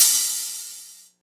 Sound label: cymbal sound clip g key, Royality free cymbal sample tuned to the G note. Loudest frequency: 7969Hz